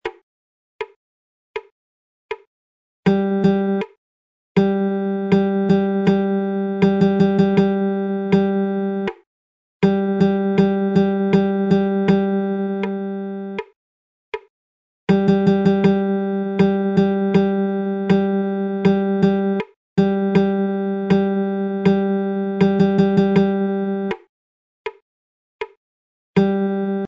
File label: rhythm chart, strumming